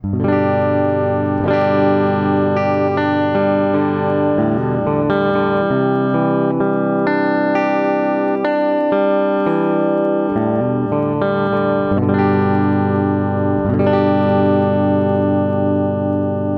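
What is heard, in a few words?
clean neck, guitar; Guild Surfliner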